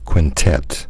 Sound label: quintet